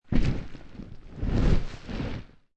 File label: Dragging Dead Body, Dead body being dragged along dirt and gravel; Objects